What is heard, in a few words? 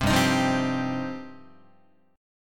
G 7th Suspended 4th Sharp 5th, Guitar